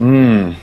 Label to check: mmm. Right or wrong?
Right